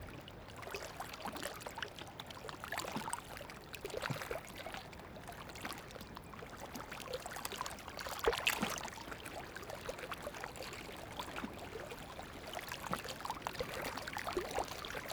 WATERFLOW Lake Shore Waves Detailed Windy Close Loop; Flowing Water; 1m; Systematic-Sound; Sounds Of Nature – Flowing Water 01